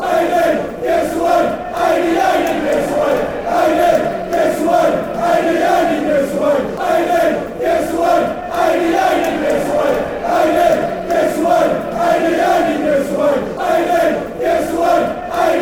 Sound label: give us wave, fan chants; aidy